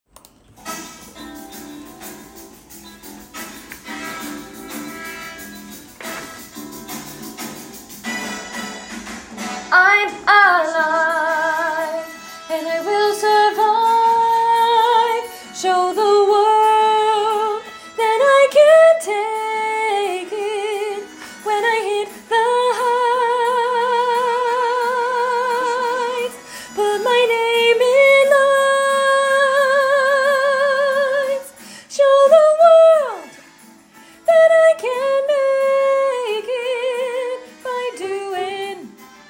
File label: Melody + Soprano; Soprano 2 Bars 361-End; Fame The Musical - Holiday Pack